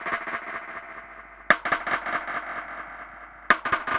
Dub Drums B Echo Snare 120bpm; musicradar

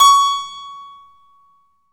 KEY HRPCR, Roland; Roland - LA Composer vol. 1

